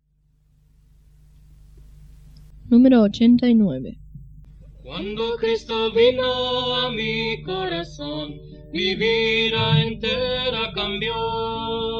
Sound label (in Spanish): Coro, himnario niños